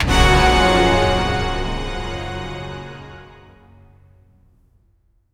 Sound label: orchestral hit; short